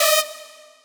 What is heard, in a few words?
synth